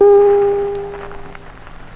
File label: scratchy tone, Synth